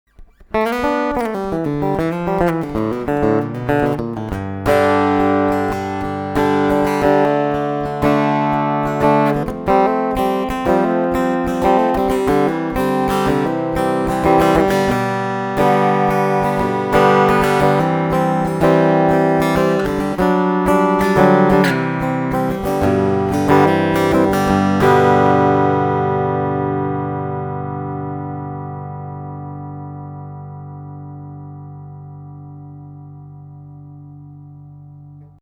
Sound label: ESP LTD TL 6 Midboost Picking